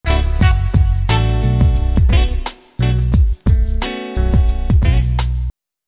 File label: Jazzy